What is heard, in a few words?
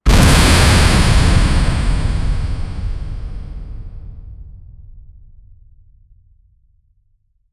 a powerful ground impact; physical